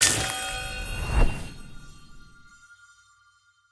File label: ice activate; smite; merlin